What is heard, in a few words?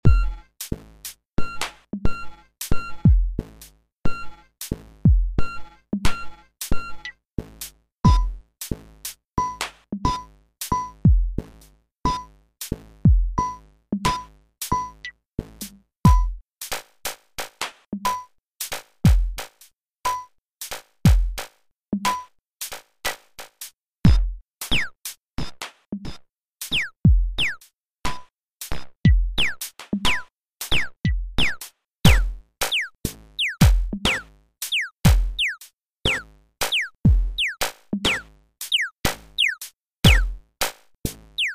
Horror music